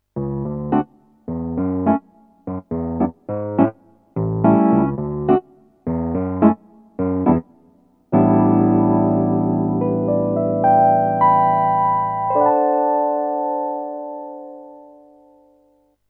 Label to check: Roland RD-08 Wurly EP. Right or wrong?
Right